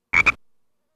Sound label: Frog left